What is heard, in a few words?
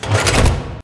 Delivery Cage In Start; Titanfall 2; Boomtown